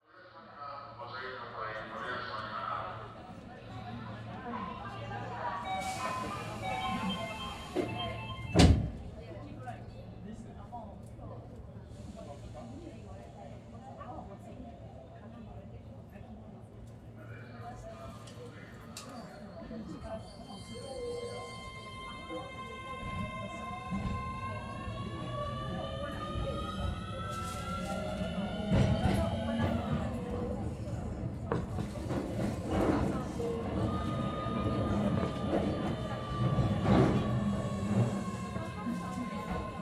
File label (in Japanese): 新宿～高尾山口 環境音; カノジョと!～ひなと初めての山登りしてみよっ♪～